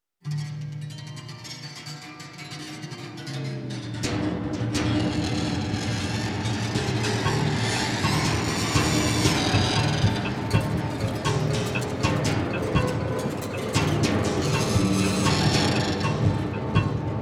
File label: zith cacophony